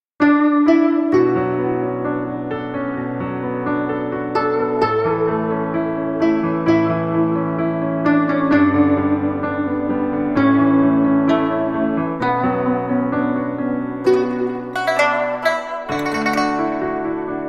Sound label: bgm normal